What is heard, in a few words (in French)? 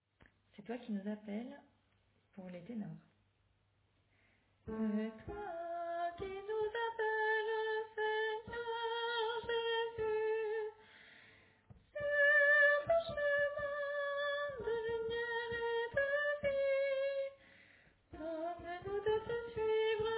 Tenor